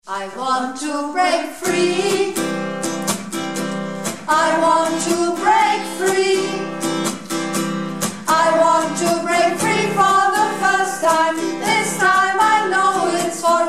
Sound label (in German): Stimme 3